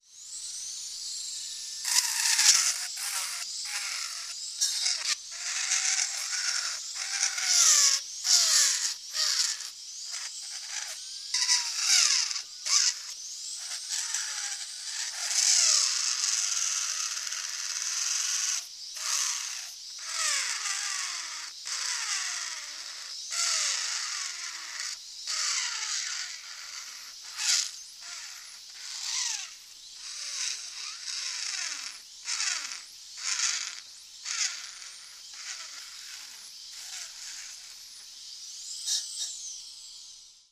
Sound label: Drilling Tooth, dentist drill